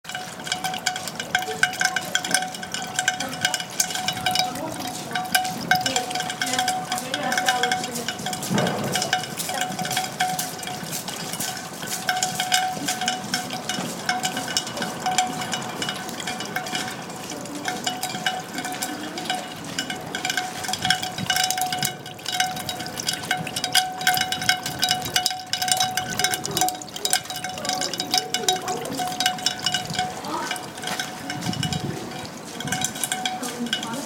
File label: sound raindrops